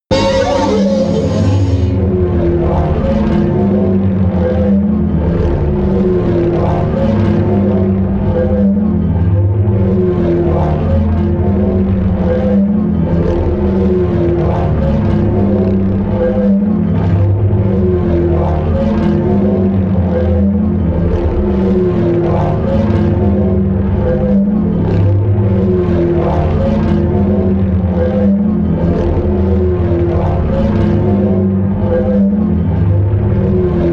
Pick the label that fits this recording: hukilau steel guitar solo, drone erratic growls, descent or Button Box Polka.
drone erratic growls